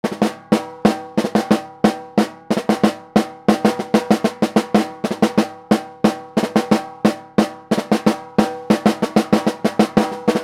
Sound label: civil war drum loop